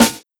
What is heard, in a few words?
trebly snare drum a sharp key